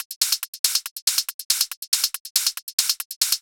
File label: Hi Hat Loop, Psytrance